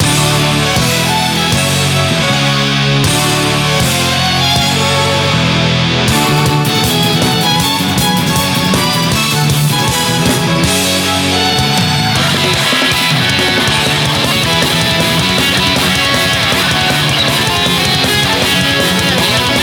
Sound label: Bgm Reach